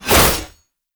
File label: Whoosh; Warframe; Melee; 1999 Skana